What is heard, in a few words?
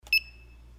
buzzer; bixolon